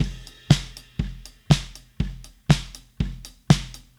Drums Crash, 70s Analog Funk; Sonic Foundry (Sony Creative Software); What it Is! 70s Analog Funk

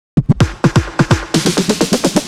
Fills 128BPM, Clubsounds

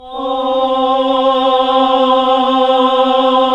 HAL AAH, Nuns Aahhh

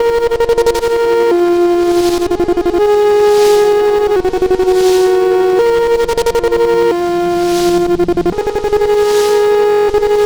Stutter Melody; Drum n Bass; Synth & Melody; KJ Sawka; Drum n Bass & Beyond

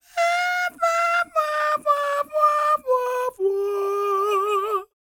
scat, HUMAN TRPT, Jazz FX; Spectrasonics; Vocal Planet

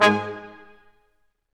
BRS Quintet staccato, Symphony Orchestra

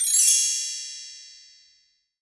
Bel Tree, Roland SPD-S

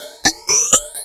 HH LOOP; USB Soundscan vol.27

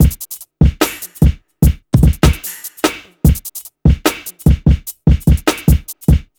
BEAT, Hip Hop R n B; USB Soundscan vol.19; AKAI

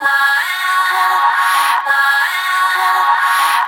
Space Choir; Sonic Foundry (Sony Creative Software); Underground UK House & Electro

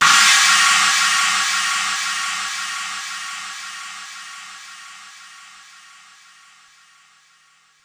Cym China, Korg 05R-W Korg Cymbals; 300 Drum Machines

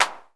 909 CLAP

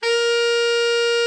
TENOR, tenor sax; Best Service; Killer Horns

